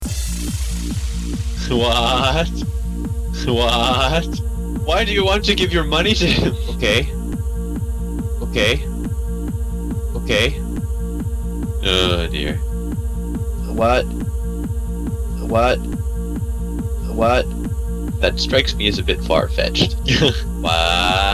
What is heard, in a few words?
Techno Remix; Daizenshuu EX